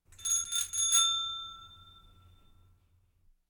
fahrradklingel, mehrmals; Auto-Zweiraeder

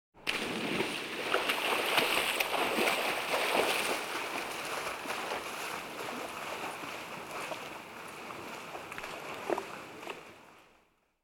tauchen und vorbeischwimmen, Am Meer

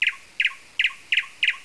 vogel

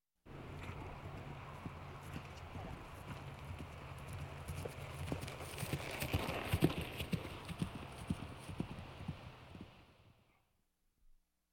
pferd - kurzer galopp im freien; Auf dem Land

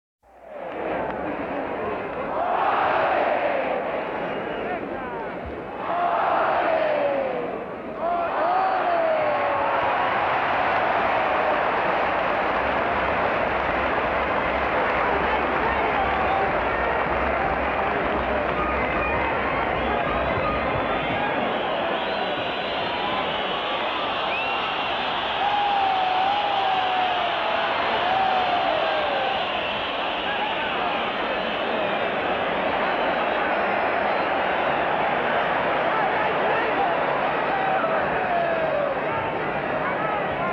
sport - menschenmenge beim stierkampf

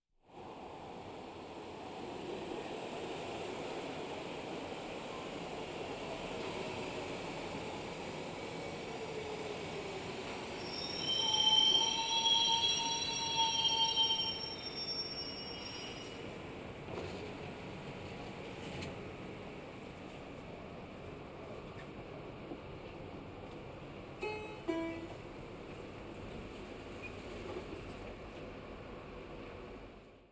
u-bahn (ankunft); Großstadtgeraeusche

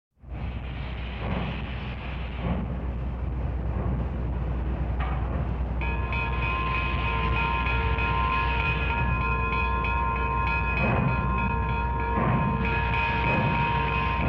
dampflok union pacific switcher; Bahnhof

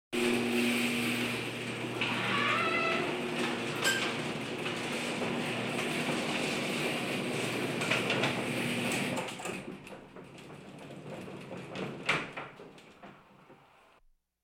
garagentor öffnen (automatisch); Auto-Zweiraeder

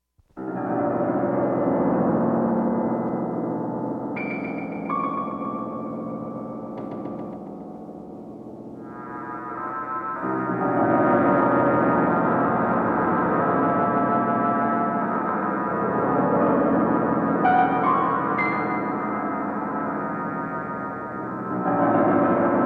explosion im weltall; Science Fiction

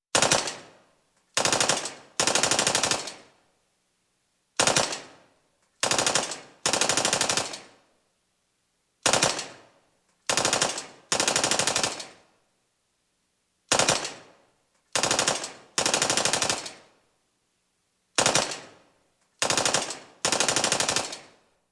maschinengewehrfeuer; Katastrophen

